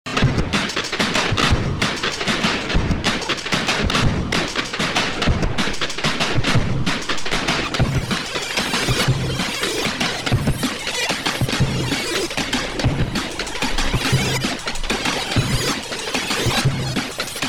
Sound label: drum & bass; ceckj